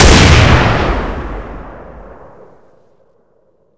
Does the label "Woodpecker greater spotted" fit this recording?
No